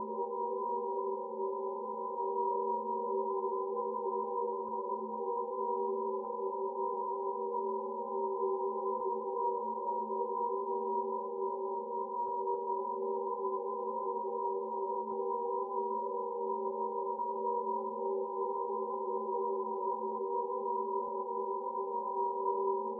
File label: Drone cold erie dissonant mid range chord with lower hum in background